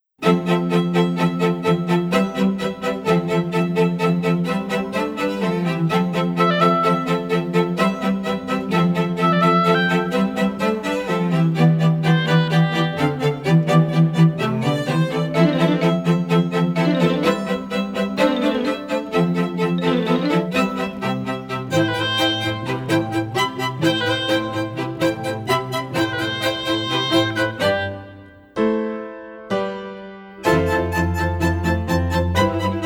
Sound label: acoustic mix